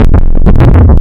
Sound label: eguitar, wavetable; Adventure Kid